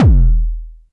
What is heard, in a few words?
Kicks, Elektron MachineDrum SPS1 MKII